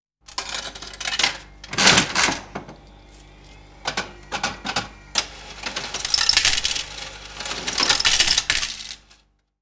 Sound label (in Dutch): gokkast trek arm